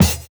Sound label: Kick, jungle breaks drum sounds